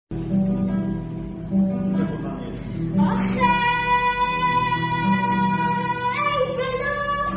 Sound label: Gitar